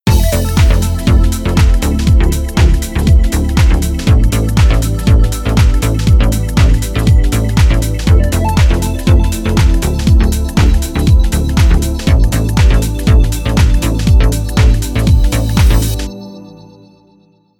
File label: Synth Pad Chord Pad